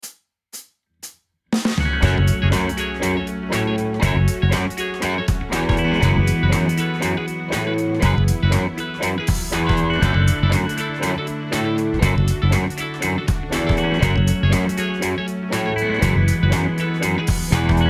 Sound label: Riff